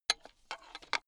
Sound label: metal pipe handling low short